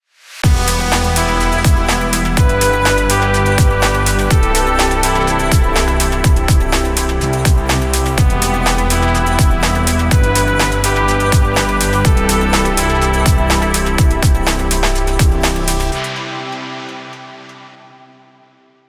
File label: futuristic technology dreams